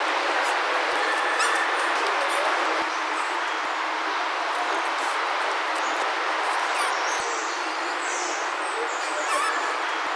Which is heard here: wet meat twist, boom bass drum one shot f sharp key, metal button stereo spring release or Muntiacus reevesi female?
Muntiacus reevesi female